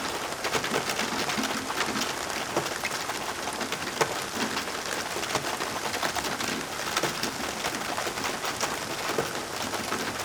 Ambient Forest nature Sounds Background Naturaleza Relajacion, Jungle Rains